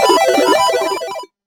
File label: puzzle solve; puzzle mechanics